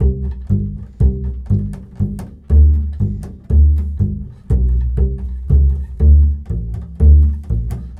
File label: Bull Fiddle, Appalachian